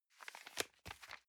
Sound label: envelope swap soft